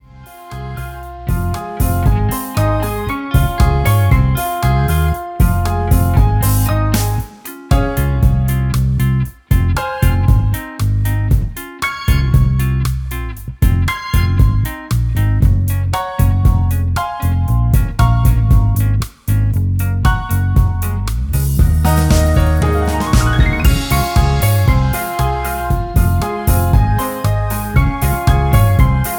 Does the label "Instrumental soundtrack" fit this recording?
Yes